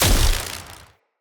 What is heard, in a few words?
Cannon Tesla Shot; Weapons; Player